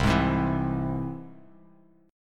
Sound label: C Augmented down; strummed; JGuitar